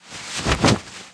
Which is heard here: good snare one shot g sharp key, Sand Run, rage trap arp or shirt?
shirt